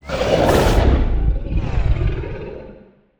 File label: Digital Creature Scifi, Monster Sounds; The Decline Of Warriors; Monster Sounds Volume II